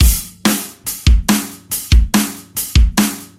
easy rock, funky drum loops

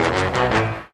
horned

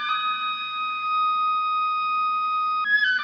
flute

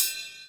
Real Open, Hats

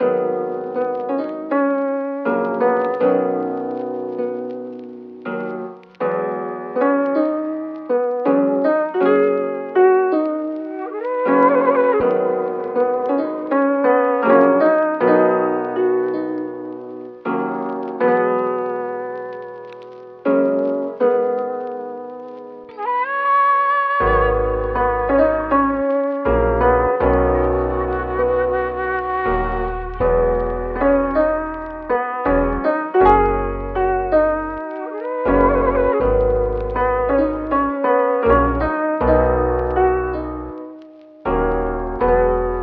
piano and some flute, Sad Loops

